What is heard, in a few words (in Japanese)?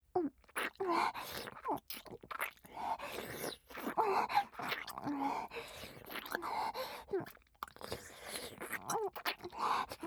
大人のキス, ASMR